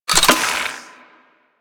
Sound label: Secondary Mech, Weapons Cannon; Kuva Zarr